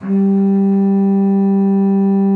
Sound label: bassoon G3; Xsample VOL-6; C BASSOON